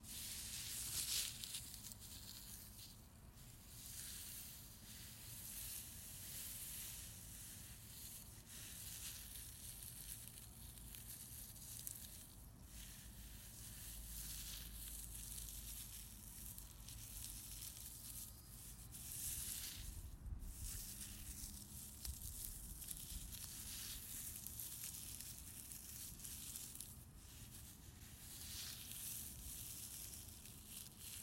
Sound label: crunch